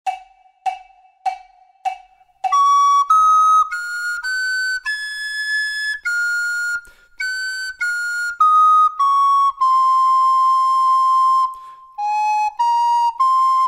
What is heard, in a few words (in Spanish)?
DUETO FLAUTA